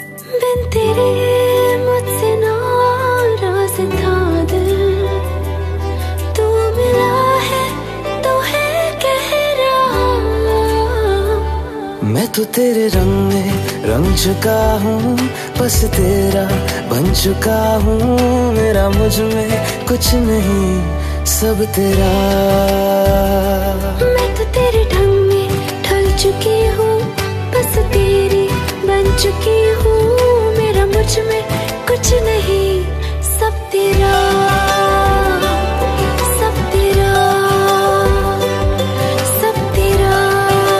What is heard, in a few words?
Romantic Bollywood Ringtone